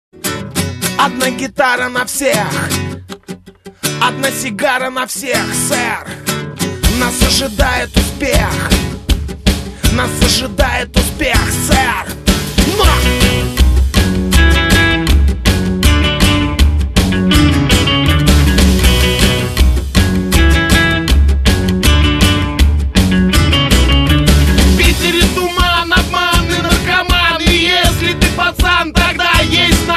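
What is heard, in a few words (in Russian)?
gitara, guitar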